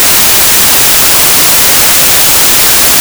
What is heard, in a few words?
noise